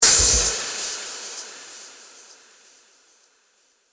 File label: high hat